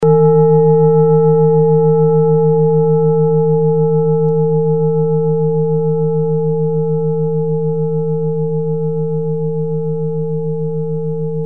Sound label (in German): orissa planetentonschale